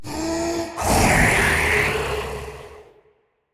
Wind Zephyr

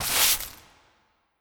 Broom

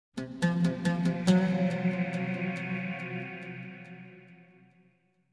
Strings; Cairo

